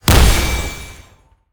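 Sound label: Gorilla explosion; monster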